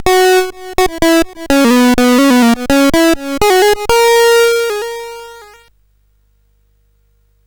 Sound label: Arcade, sid CSG8580R5; Commando